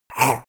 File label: monster attack gurgle; koerpergeraeusche; comedy-cartoon; soundpool